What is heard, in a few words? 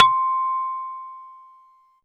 Bells, Synth Bells; Dream Zone